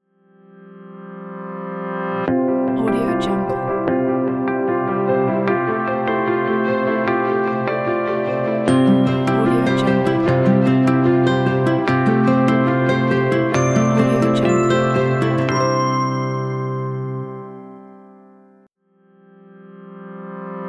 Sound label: elegant logo opener